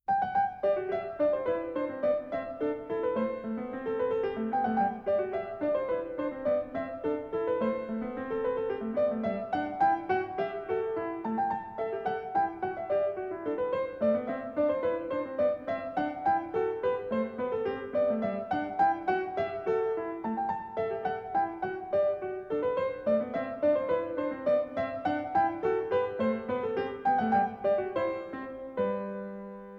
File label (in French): Canon pour 2 instruments en sol majeur; Ludwig van Beethoven - Complete Works [Brilliant Classics 100 CD Box]; Canons, Epigrams and Jokes; Hess 274